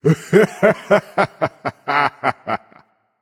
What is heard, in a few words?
laugh; Smite; baronsamedi